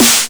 Snare; VEC1; Club

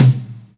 Tom, Hip-Hop, Drum Kit

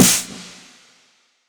Snare; VEC1

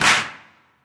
Clap

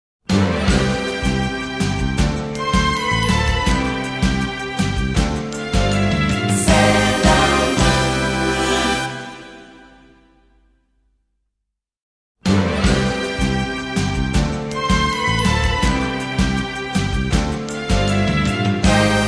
Eyecatch Music; SailorMoon

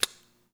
Click

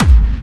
BD Clubby; VEC1

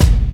Kick

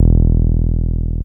Bass Long E; VEC1; Club; Long Basses

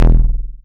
Bass E, Offbeat Bass; Club; VEC1

